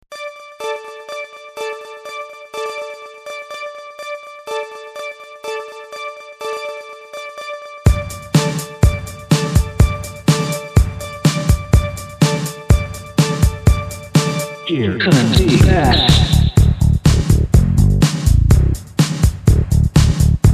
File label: here comes dee bass